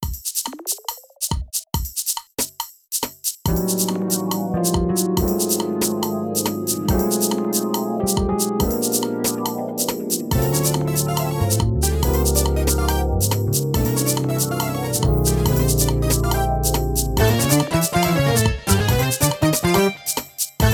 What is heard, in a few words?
Fusion No Drums 140bpm